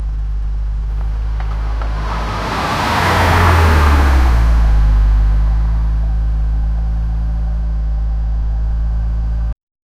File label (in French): voiture qui freine; brake